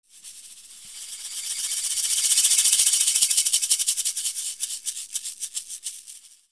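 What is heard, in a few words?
percussion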